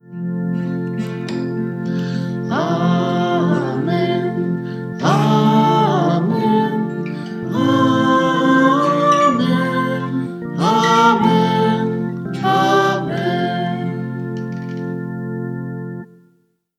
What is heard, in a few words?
Amen